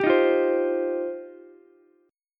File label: F 7th Suspended 2nd F Ukulele; strummed; chord; F7sus2; JGuitar